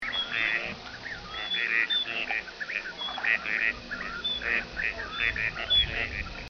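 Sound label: gadwall, birds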